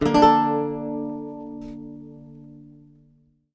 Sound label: Lute Performance; The Witcher 3 Wild Hunt